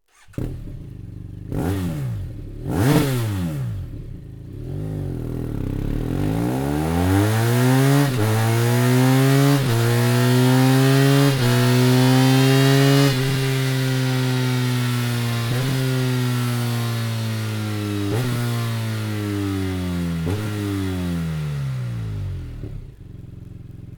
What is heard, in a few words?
slip on; akrapovic